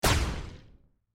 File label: Cross Bomb Destroying; My Cute Commissar